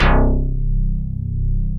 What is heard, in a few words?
FM BS, SYNTH BASS; OBDX; East Collexion; Bass S3000